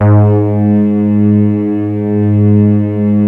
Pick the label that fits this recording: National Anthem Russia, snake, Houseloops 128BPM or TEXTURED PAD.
TEXTURED PAD